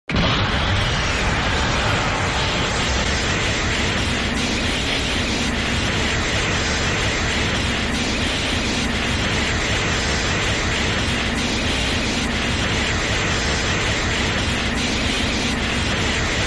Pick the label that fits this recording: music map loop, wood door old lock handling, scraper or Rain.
Rain